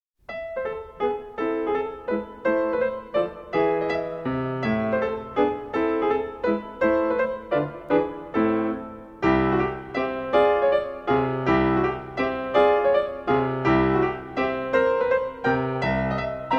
Sonatina In A Minor; Magrath Book 06; Mvmt. III

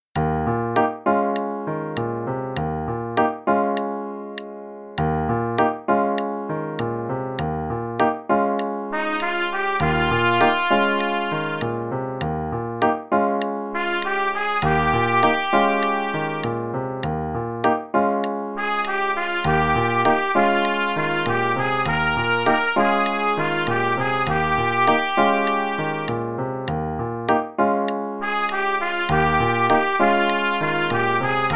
Play-a-long both parts, qn=200, trumpet

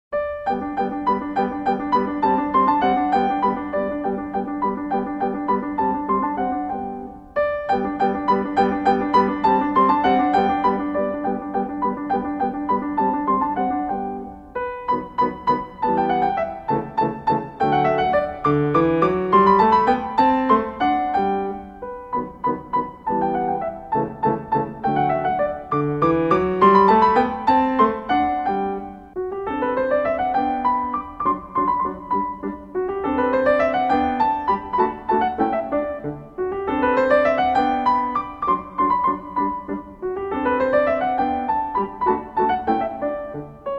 German Dance In G Major; Magrath Book 04

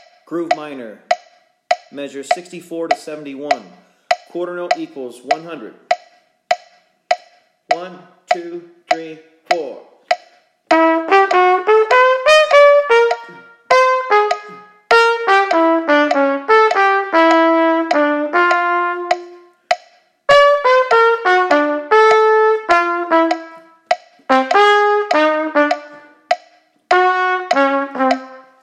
Play-along, Groove Minor, qn=100, trumpet

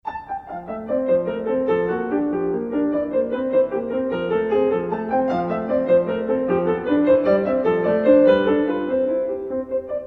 Sonatina In F Major - Allegre; Marlais Book 5

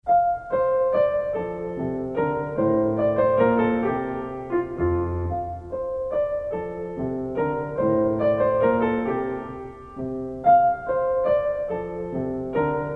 Minuet In F Major; Marlais Book 2

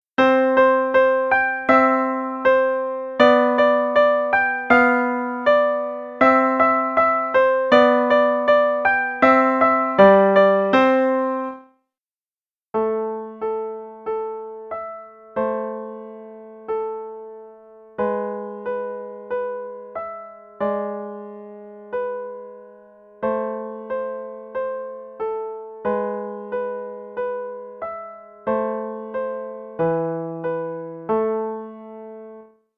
Two Little Marches, no click, qn=160, 92; Level 1 Performance

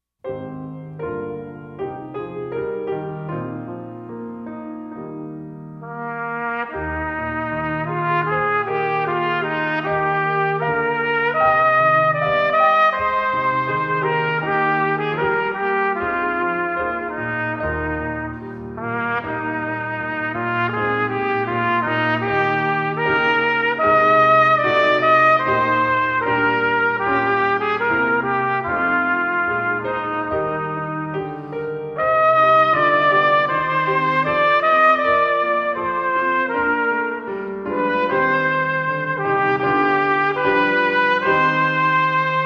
The Minstrel Boy performance; canadian brass easy solos

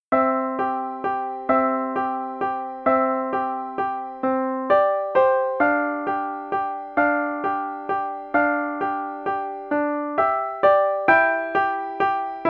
Music Box Waltz, no click, qn=132; Level 2B Performance - page 14

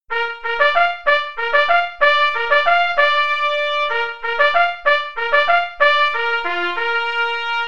Bugle Call Officer's Call (qn=96)

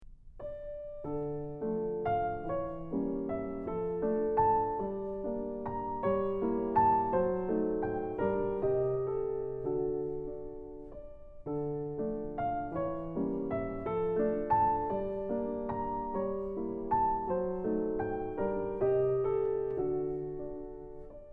Waltz; Magrath Book 08

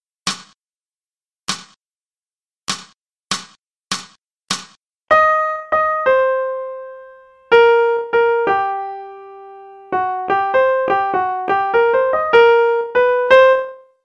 Rhythms, qn=100

